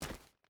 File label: footstep hide stones run; Rust